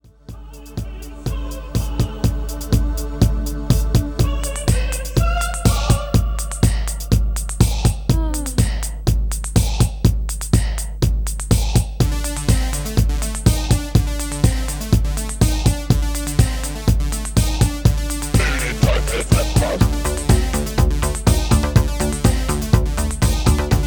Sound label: Teufelsrhythmus Techno Mix